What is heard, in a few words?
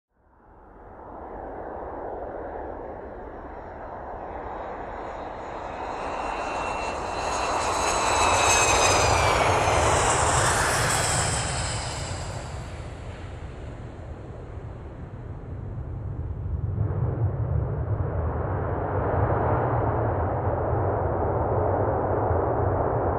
Airliner Taking Off